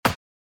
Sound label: Percussion Samples trance